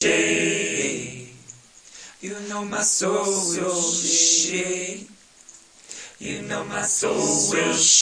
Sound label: soul shake vocals